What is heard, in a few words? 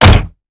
door close; Golden Crayon Sounds